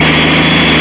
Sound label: Dm11 run; finnish